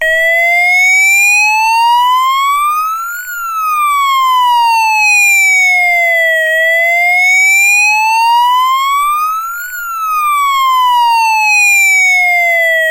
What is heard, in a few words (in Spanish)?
Tono Ululante